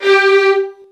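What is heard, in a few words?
violin; Virtuoso Strings; G2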